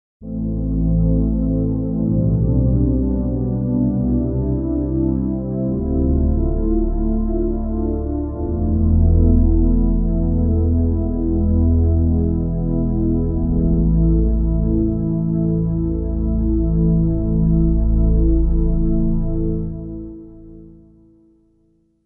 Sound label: Stereo Saw Pad